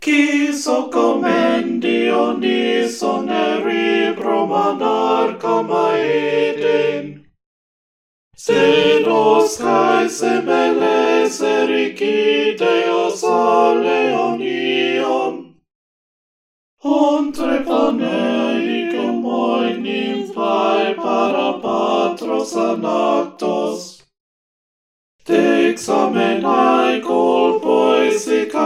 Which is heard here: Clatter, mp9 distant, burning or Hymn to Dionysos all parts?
Hymn to Dionysos all parts